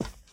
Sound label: carpet step; Golden Crayon Sounds